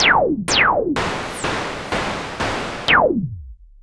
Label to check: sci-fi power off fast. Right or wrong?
Wrong